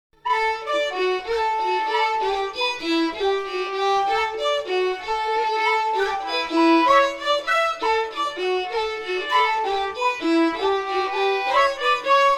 sport jig slower in D

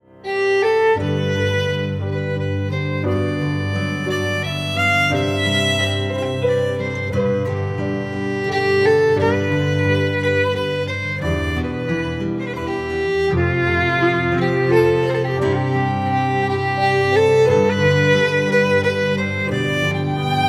Waltz in G

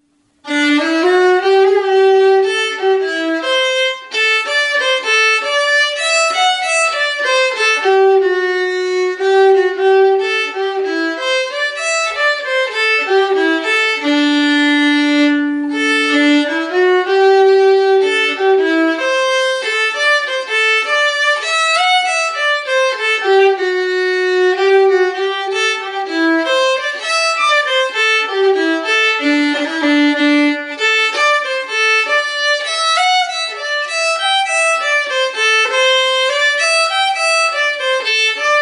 Jig slow

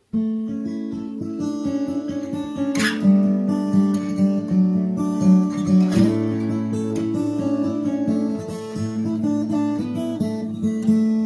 donegal lass guitar slow

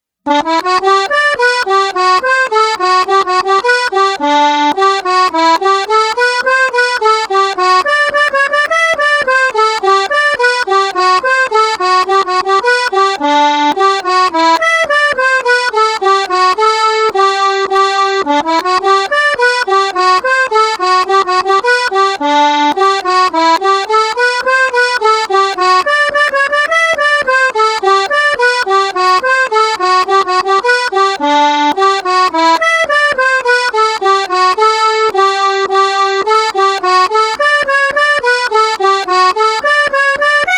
wonder hornpipe slow

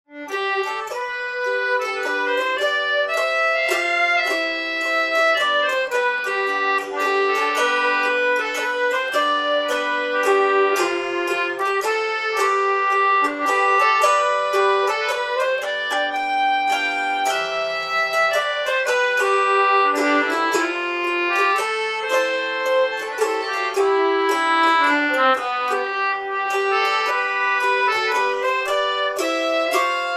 Waltz

